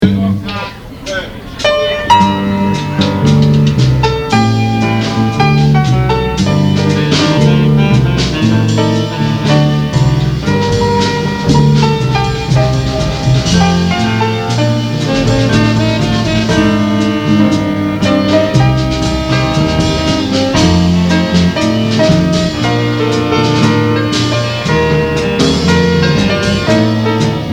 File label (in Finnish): Samba